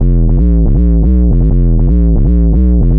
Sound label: Busy Bass; Sonic Foundry (Sony Creative Software); Knutrix Drum & Bass